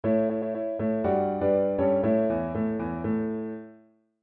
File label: 1 Up; segagenesis; Sonic the Hedgehog